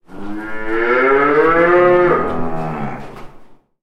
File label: calf, cow calf moo